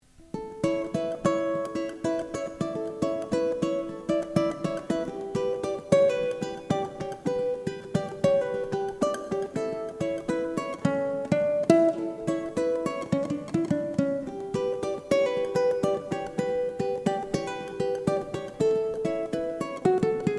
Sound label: uke